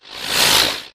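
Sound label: Drone Movement Turn; Titanfall 2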